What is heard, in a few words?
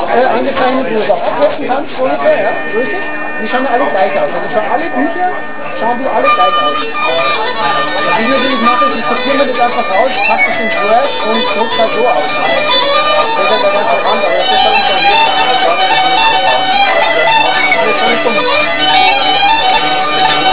Irish Band; Irish pub